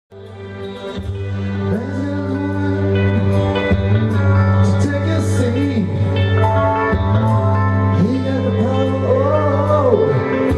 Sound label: Impersonator; Las Vegas; Alice Cooper Impersonator